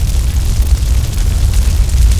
Burn Tank; 1944 Battle Of The Bulge

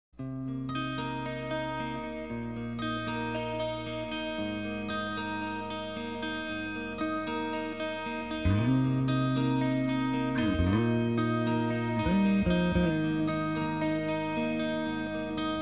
SLOW; X-Men - The Phoenix Saga

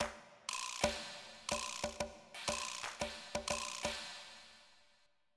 jazz; TG500

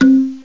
kalimba

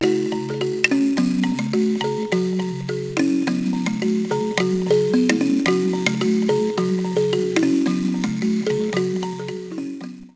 kalimba; Compton's Encyclopedia of American History

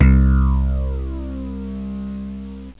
mirage bass flange; OctaMED SoundStudio V1

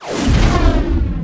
fusion Launch; X-COM; SpaceFlight

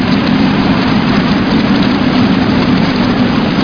air tunnel

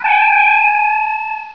Ground Energize; Star Trek JR Audio

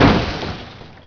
COLLIS, Prangs

